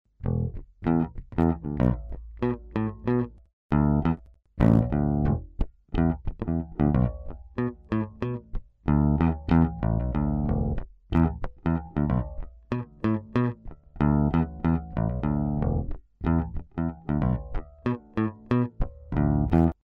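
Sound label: grabber pickup, guitars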